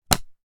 カードを出す音; board game casino mahjong

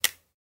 カードを出す

